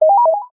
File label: multimedia game sound positive action tone